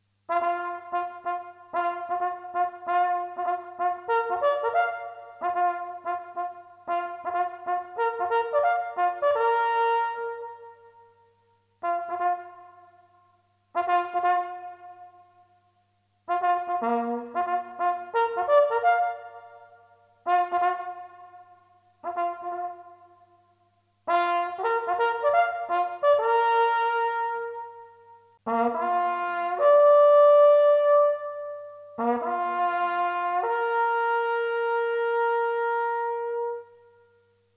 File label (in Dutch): muntjak tot